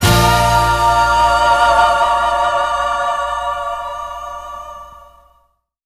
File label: Stabs